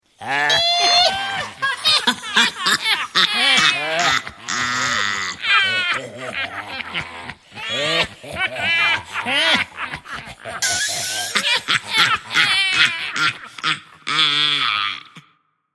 Cackling Witches, An evil group of witches laughing.; Evil Laughs; Horror